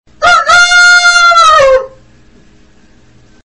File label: animals rooster ringtone, sms